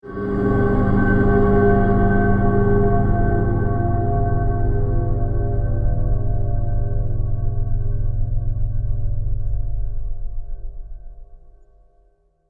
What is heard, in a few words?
Sting Fantasy Toll; Brand X Music; Brand X Music Catalogue - Editor's Essentials